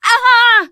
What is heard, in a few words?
hurt major, teen girl; player; survivor; voice